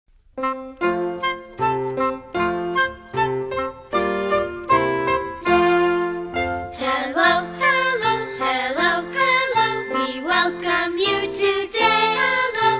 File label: hello song words and music